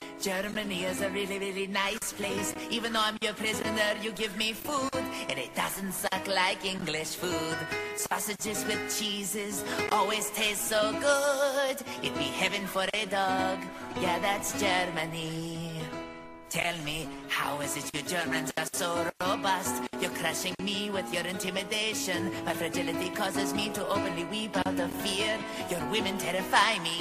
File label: famous song tone ringtone